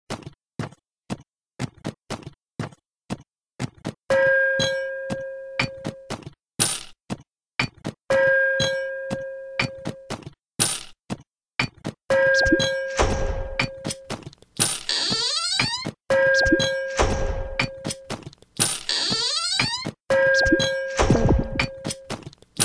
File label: beatboxing; alter aeon dclient